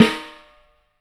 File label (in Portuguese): F-CAIXA OP; AKAI S-Series CD-ROM Sound Library VOL-5